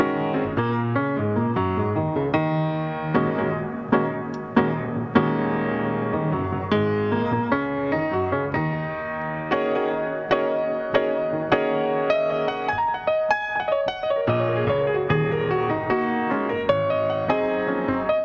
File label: piano; battlecomp vintage